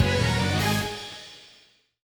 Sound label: Jingle Fetch quest payoff; Miraculous Life Android iOS mobile gamerip 2022